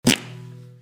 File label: impact